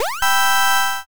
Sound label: hero pose